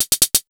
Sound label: 125 HH LP, Big Beat Drumloops; USB Soundscan vol.25; AKAI